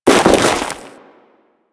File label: Crewman Land Ice, Footsteps; Warframe; Corpus